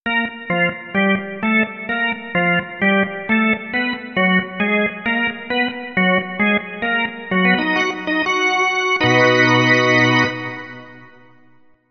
Organ Teasers

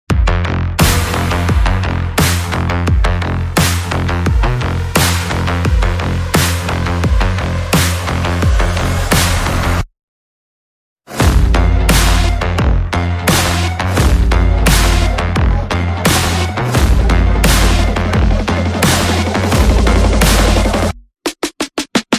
Beat Drop, No Vocals

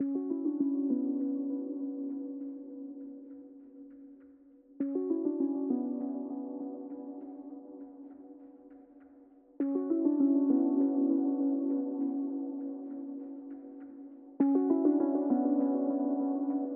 Lead Motif High Percussion Only

